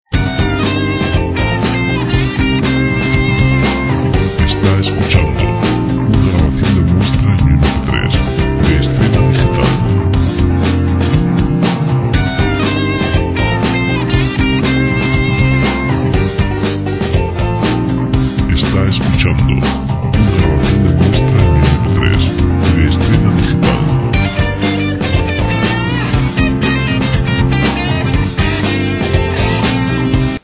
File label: 120 rock